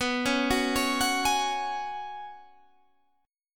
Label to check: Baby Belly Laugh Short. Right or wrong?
Wrong